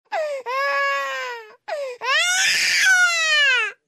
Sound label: Baby crying and a short scream